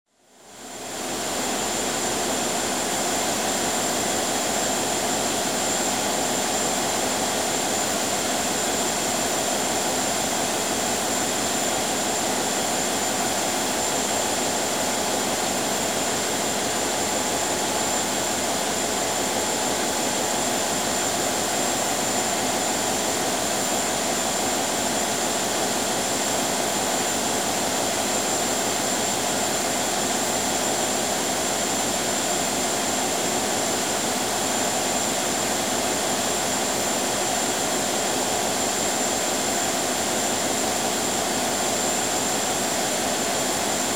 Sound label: ship engine room; Transport; BBC Sound Effects Library